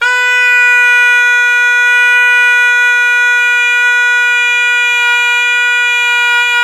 BI SUA B4, BISUA REED; Spectrasonics; Heart of Asia

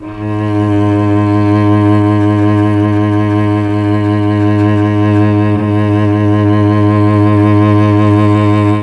G#1 CELLO, Mellotron; CHMBLN CELLO; Mike Pinder - Mellotron Archive CD

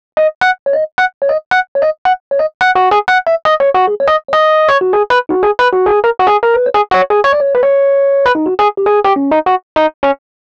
Rhodes Solo, Cinematic Funk; Cinematic Funk Urban Tone Poems

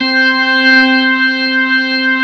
HAMMOND; ORGANS; Ragga & Dub Reggae

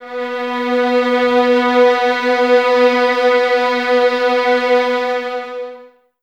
Violins B 3, String Ensembles; Miroslav Vitous; 11 Violins

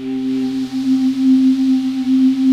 hollow pads; Pro-Rec - Synthsonic; Z1 PADS

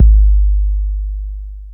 TR-808 Kick, Roland TR-808

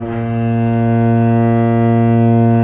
A#1, VIOLE, Historical Instruments; USB Soundscan vol.23

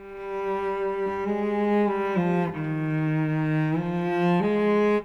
Cello, Orchestral; Sonic Foundry (Sony Creative Software) - Orchestral VOL-2; Modern 36

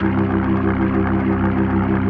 C1F, B3, Vintage Keyboards

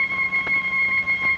OPTI B3 C#4, OPTIGAN; Sonic Reality; Vintage Timetraveler

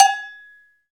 CowBells

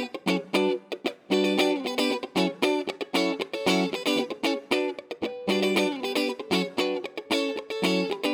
Rhythm Guitar, E 115bpm; Zero-G; Nu Disco; Construction Kits

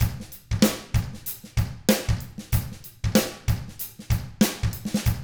95 FUNK, Drums; ILIO; Double Platinum Drums 1

